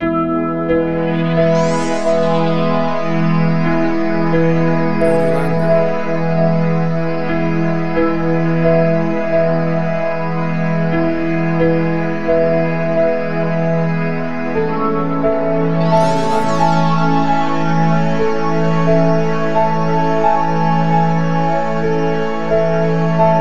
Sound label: Ambient Dream